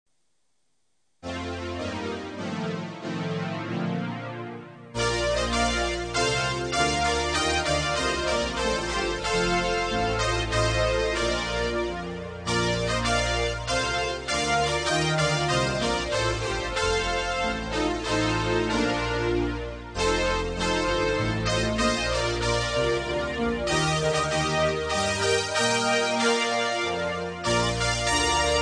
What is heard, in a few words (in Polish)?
mazurek